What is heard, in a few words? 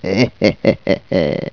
HAHA; Nare Gewoontes